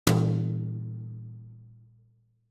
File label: ambient